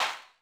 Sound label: Dance Kit, Korg 01W